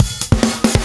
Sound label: Fill 140 BPM